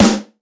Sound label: snare a key, tuned snare; tuned snare samples